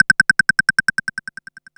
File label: CLICKER LO; Back In Time Records; Korg Universe VOL-1; DRUM FAMILY